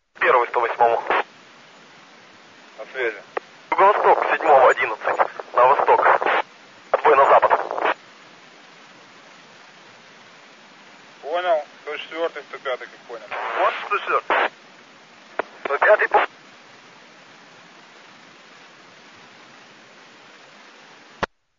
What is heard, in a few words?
sheriff secur, signals